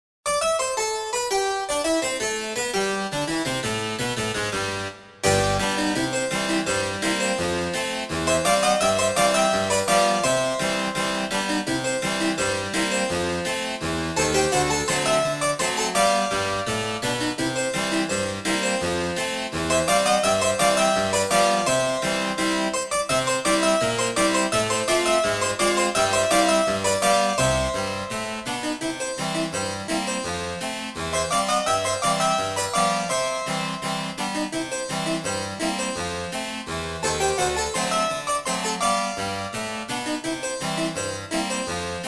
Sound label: adaptive 7th Harpsichord; Entertainer